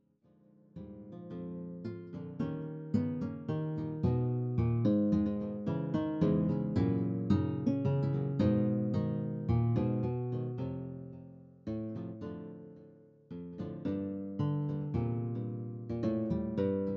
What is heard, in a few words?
Classical Guitar